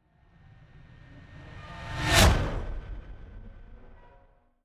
fly by hover car hum high; swooshes-swishes

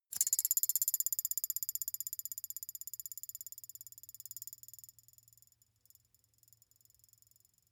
thin metal boing vibrate long

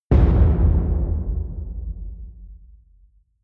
wood hit large table

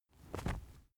wing flap leather single; natur-tiere; voegel

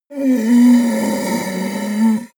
yeti groan pain

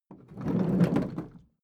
roll smooth; haus garten; wohnen

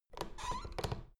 door plastic handle pull up creak soft

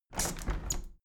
window handle plastic squeak close regular; objekte; fenster

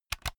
insert usb laptop unsteady, elektronik; objekte

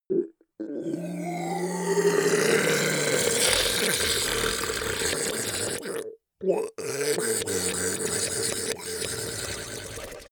ice golem melt groan start; koerpergeraeusche; comedy-cartoon; soundpool

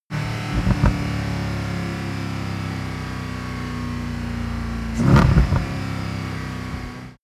car mercedes sls amg decelerate rev

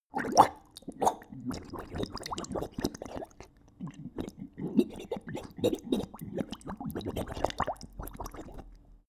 dog drink bubbling; natur-tiere; hunde

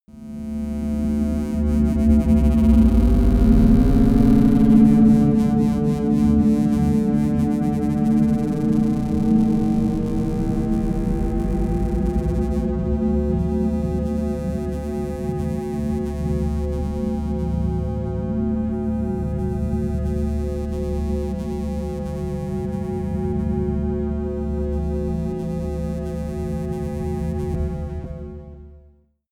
humming wobbler; flaechen

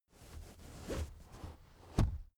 car armrest backseat open slow

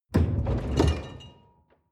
folding door open short

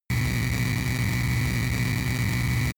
engine small overload digital; energie

